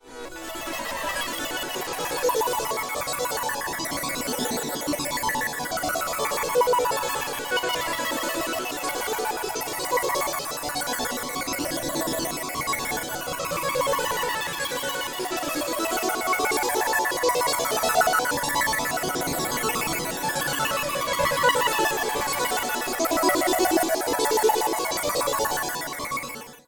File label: Space Trills; 1010music; fxbox